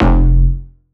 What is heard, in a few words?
Bass Shot F